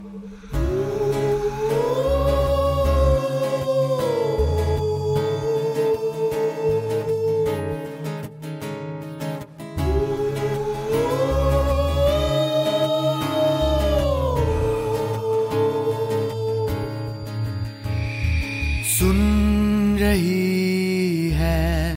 ringtone bollywood ringtones

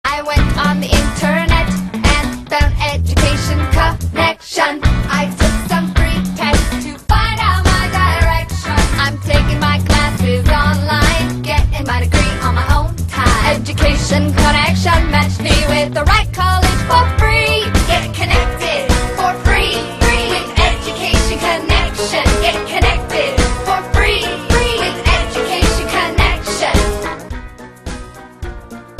song tone ringtone; english ringtones

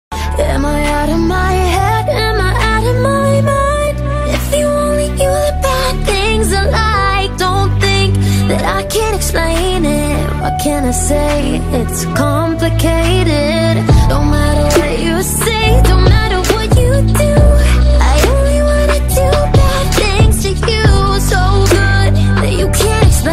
ringtone, english ringtones

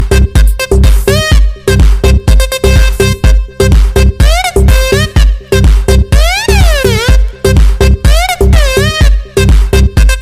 beat ringtone; nokia ringtones

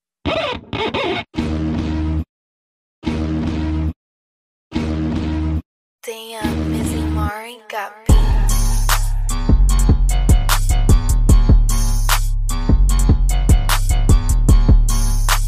english flute mix

